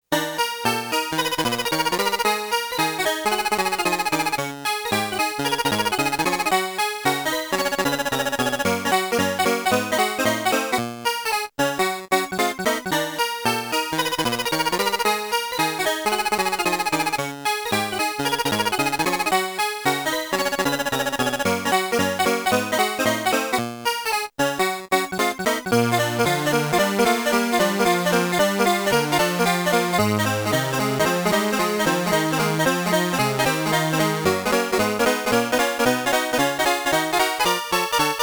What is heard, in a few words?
classic bgm; mappy